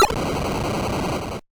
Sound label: Other attacks, 8bit; カタナ１